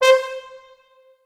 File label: Orchestral Brass